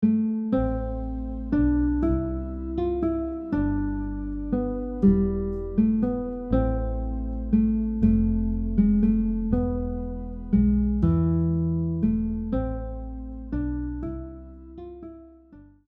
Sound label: guitar; Greensleeves; popular songs on guitar